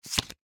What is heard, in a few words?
card flip; hilo